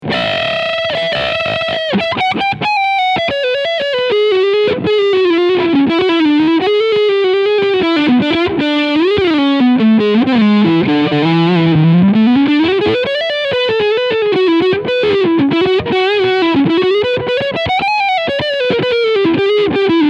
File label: Epiphone Wilshire P 90 Leadsound Neck P90